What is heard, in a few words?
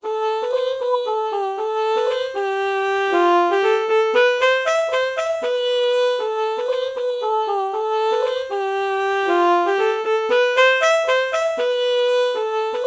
alto saxophone ah melody morph